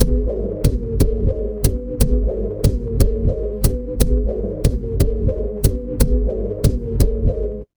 mechanical machine

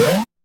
retro game jump

